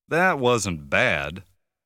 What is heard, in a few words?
That Wasnt Bad, jingles